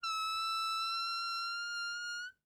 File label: fart squeak; human